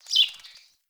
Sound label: Fey Flock Attack Dive